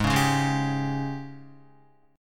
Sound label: G sharp Major 7th Suspended 4th, Guitar; G#M7sus4 strummed; JGuitar